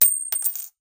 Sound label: Coin Drop Concrete Floor